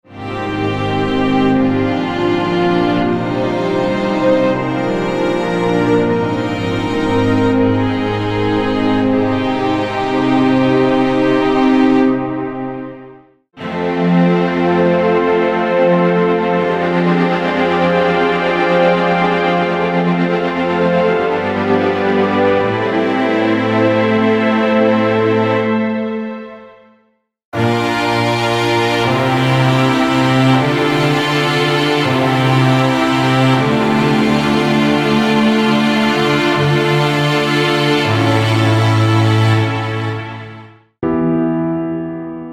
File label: Chords Cinematic Orchestral